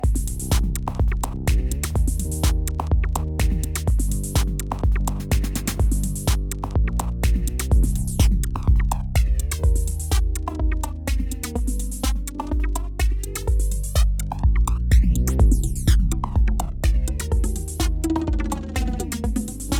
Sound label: Flanger